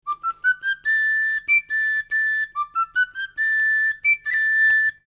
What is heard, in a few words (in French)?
Danso danso bergeireto, danses Provence; J-B.PLANTEVIN